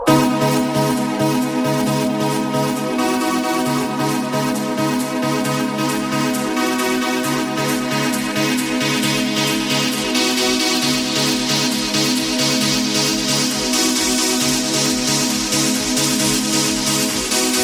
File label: Trance Ringtone